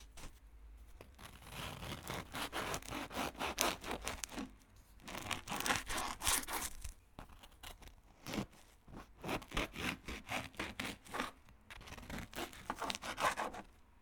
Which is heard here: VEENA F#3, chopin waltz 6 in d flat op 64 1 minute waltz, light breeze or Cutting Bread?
Cutting Bread